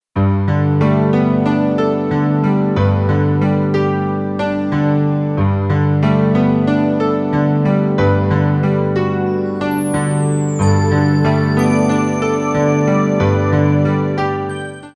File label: atmospheres